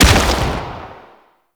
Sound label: Rock Punch Impact; Brawler; Warframe